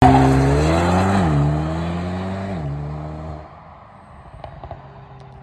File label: Drive-by; AMG